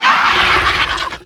Ravenous Pterrordax Play

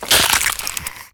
Hungry Crab Death

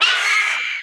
Attack; Hungry Fledgling

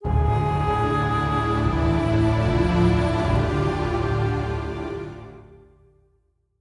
Legendary Stinger; Mr Smite

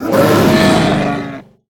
Grizzly Grotesque Death

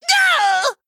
Male Gnome, Death

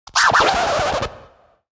Record Scratch DJ Underlay Death

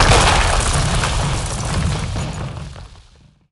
Gunspire Death; Blackowl Gunspire

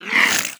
Imp

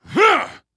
Temple Enforcer Enter Play

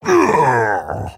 Male Tauren Death

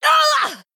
Female Blood Elf Death

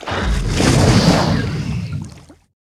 Play Water Elemental

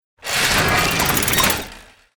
Clunky Mech Small Play Underlay

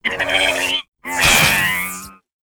Alarm O Bot Death

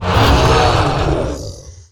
Iron Golem Play

